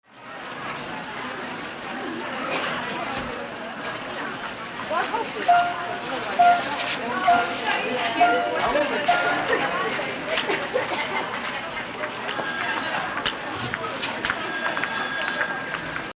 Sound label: Department Store